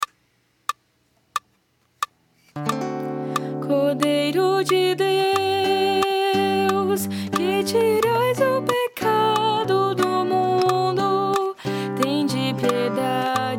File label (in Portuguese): Soprano